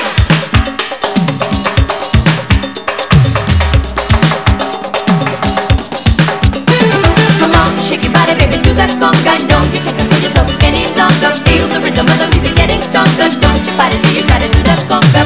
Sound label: conga